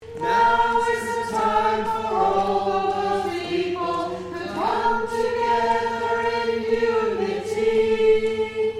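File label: Soprano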